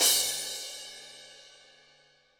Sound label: cymbal e key